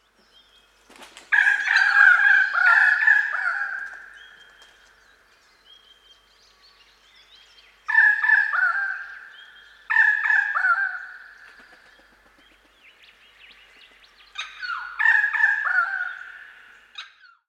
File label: Pied Currawong Strepera graculina